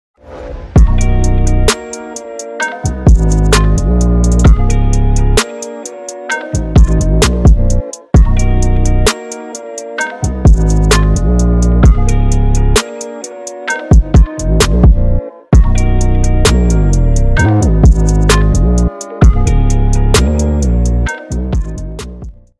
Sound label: HipHop